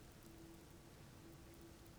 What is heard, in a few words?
pulses, BALAENOPTERA; MONACO 2022-07